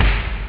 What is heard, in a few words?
pop, drums snare; OctaMED SoundStudio V1